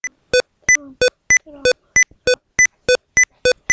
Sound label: drum